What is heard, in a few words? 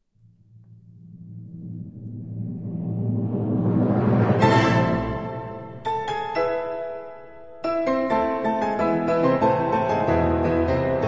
piano concert; Grieg